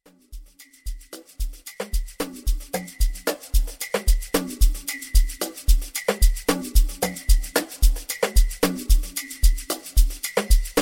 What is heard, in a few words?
Amapiano